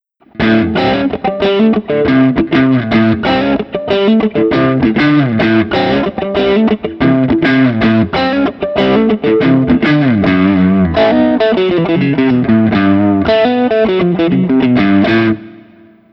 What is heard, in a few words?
Guild Citron X92; Guild X92 Citron Breakaway from the depths of 1984